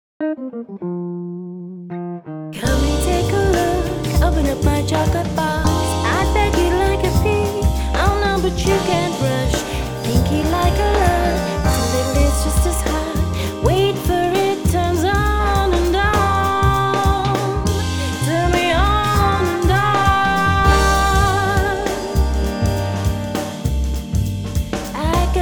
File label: Jazz Box